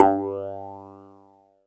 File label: THROATY, PHASE; Big Fish Audio; Synth City